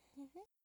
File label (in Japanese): 笑い声; ループボイス